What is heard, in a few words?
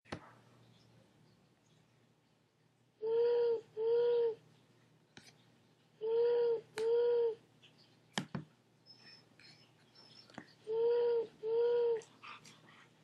Owl Hooting